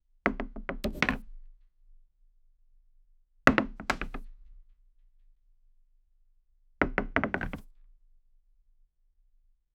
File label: chess wood board drop on